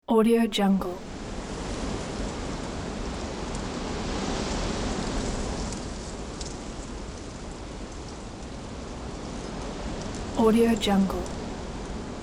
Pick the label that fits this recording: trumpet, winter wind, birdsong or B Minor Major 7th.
winter wind